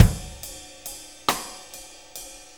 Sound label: 6-8 Loop, Full Kit Loops; Drum Tools